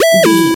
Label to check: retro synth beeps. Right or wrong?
Right